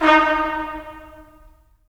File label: BRS, Tps Stacc; Roland - LA Composer vol. 4